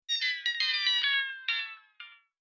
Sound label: Ice Cream Truck Melts; Transformers: Revenge of the Fallen